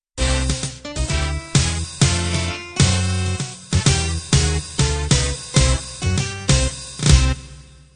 swing band end

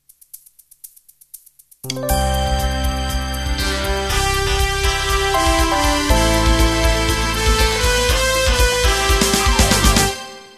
Disco Jazz Intro

